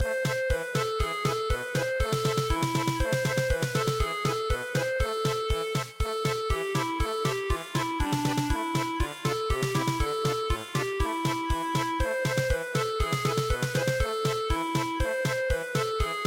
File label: Russian German Polka; midi; traditional